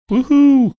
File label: woohoo